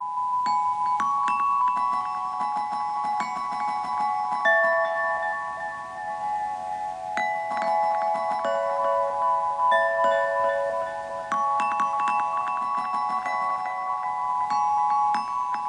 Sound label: Noise Machine; dustmotes